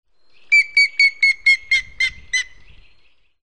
hamvas kullo

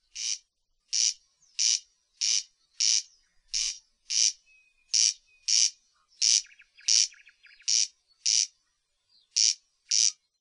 haris

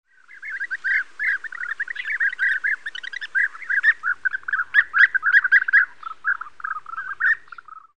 gyurgyalag

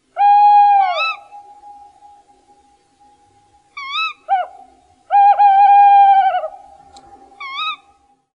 macskabagoly, madárhang

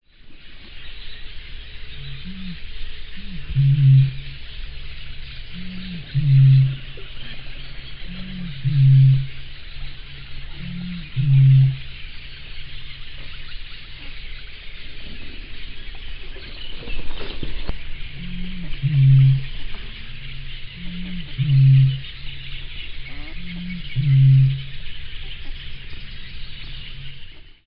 bolombika

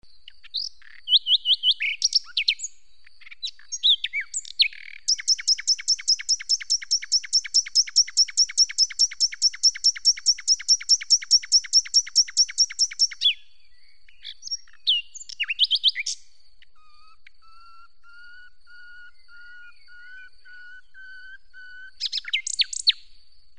fülemüle, madárhang

